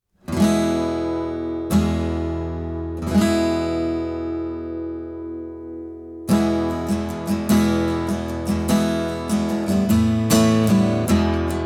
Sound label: ACOUSTIC GUITAR MONO